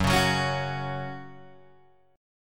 Gb 5th Gb down Guitar Open G

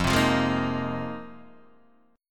F Minor 11th, Guitar DADGAD

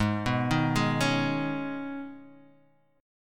B 6th Add 9th Ab, chord

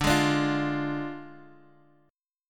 D Diminished Guitar Half Step Down, chord

